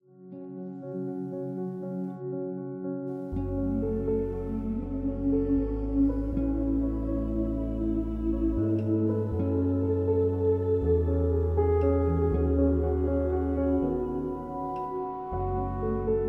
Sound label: Choir Dramatic Spiritual Emotional Pads Piano